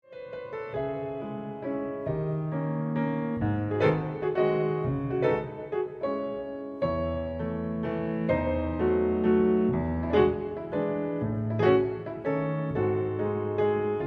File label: Chopin walz in C sharp minor